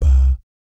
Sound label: BAP C2, Vocal Jazz FX; Spectrasonics; Vocal Planet; JAZZCOMBO